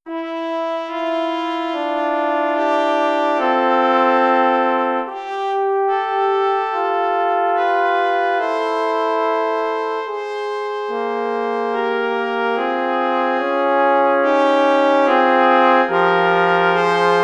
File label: Brass Trio; Brass Trio 2 Mvt II